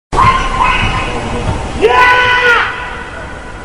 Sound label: yaaa